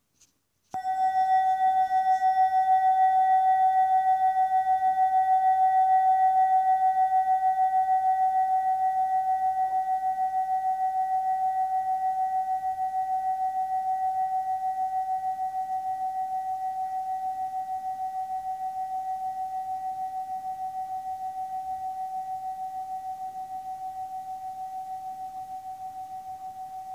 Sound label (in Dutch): Sound Klank Bel, klankschalen; centerpoint